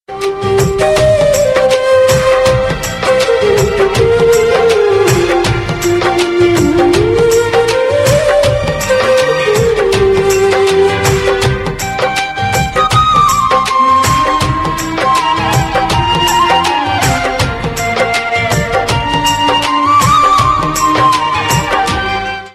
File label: instrument ringtone